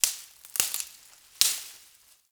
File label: Ext Branch Rustle, Ambience